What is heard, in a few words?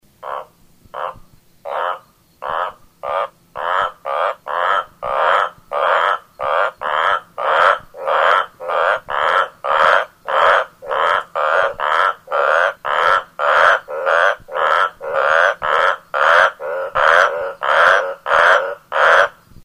bufo garmani, animal songs; Garman's Toad